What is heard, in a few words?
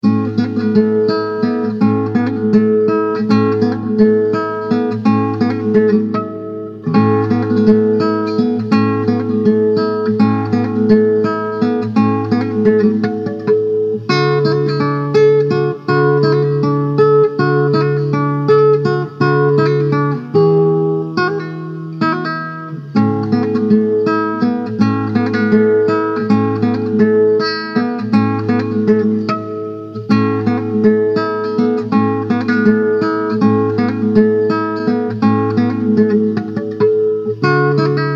Classical